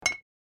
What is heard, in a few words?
Jam Landing; my cute commissar 2020 gamerip